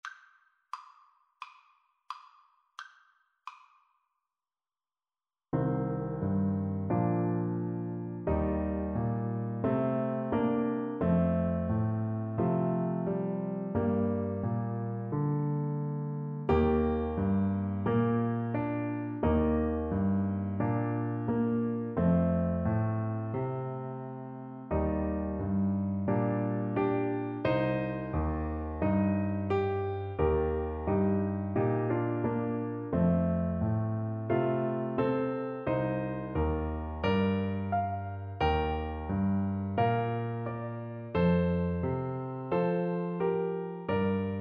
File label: wondrous, Tuba